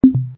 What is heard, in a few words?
blip